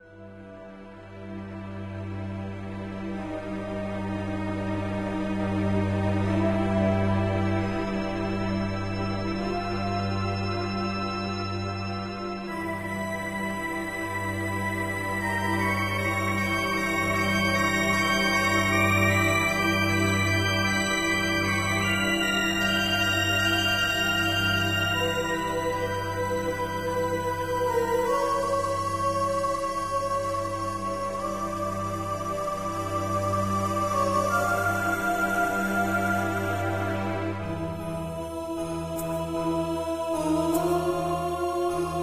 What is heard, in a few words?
Negative; MapleShrine; mkxp; gameasync